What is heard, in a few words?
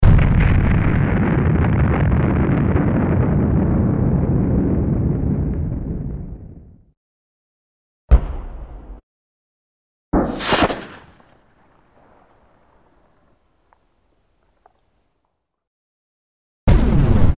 explosions mix